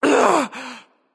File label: sigh, voicepack; smite; jorm